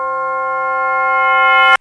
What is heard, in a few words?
space alarm; eCS 1.00; MMOS2